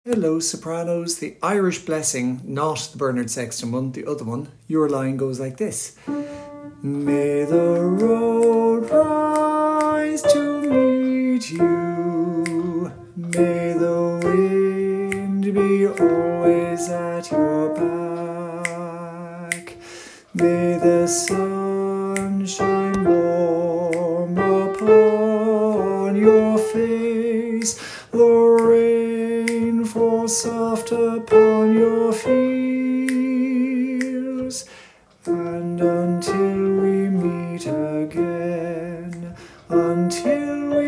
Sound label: Soprano